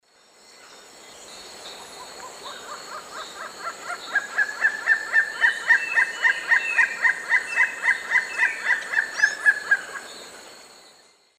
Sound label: Leptodon cayanensis, song; S. America; Eastern Lowlands